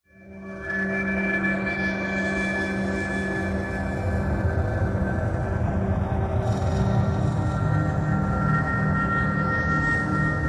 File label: Ghost Ship Slw Airy Mvmt, musical